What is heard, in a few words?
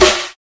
Compressed Snare